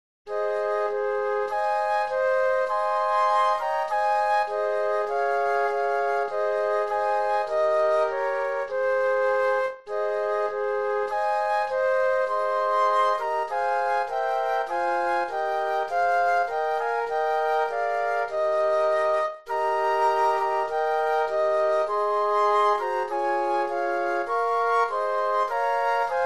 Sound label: Flute Quartet; christmas